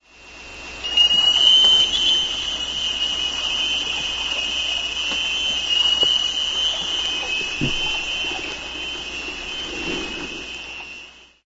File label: sonar echoes